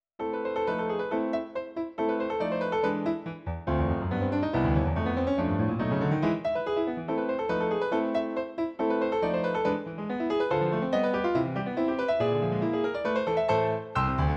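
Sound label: etude-burlesque, piano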